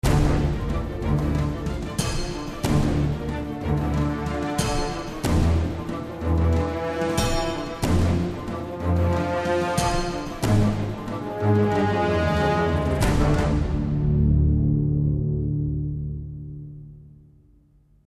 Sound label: Action